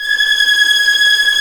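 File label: G#6 tremolo f violin left, violins tremolo; East West; The Ultimate String Collection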